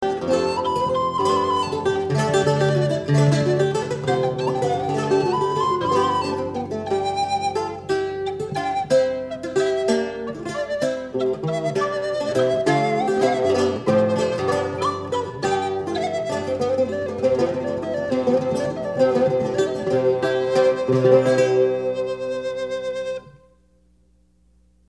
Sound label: courante